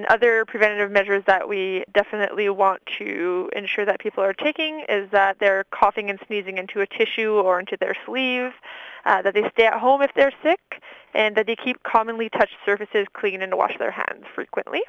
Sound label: whooping cough